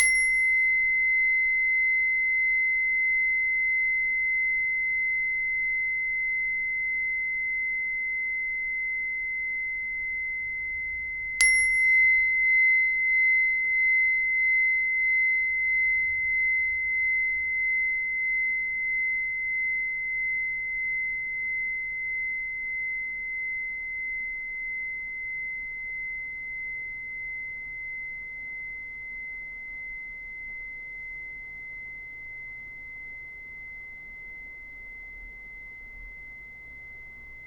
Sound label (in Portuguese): Pin Do, instrumentos de quartzo; escutar instrumentos de quartzo